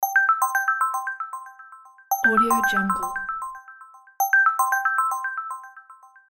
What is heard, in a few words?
bright ring tone